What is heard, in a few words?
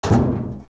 Door Metal Open; zelda; OOT